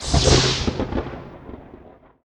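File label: Thunder; Yu-Gi-Oh! Master Duel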